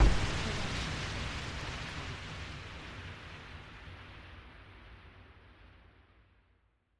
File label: Bright Explosion Blast; SoundsCrate